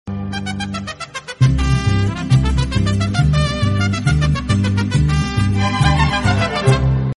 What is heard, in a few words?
mexican love tone ringtone